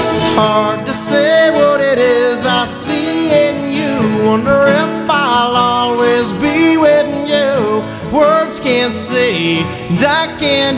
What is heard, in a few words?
acoustic